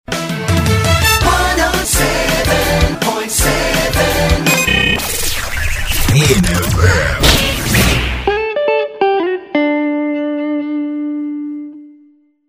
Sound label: Short Jingle; VIN FM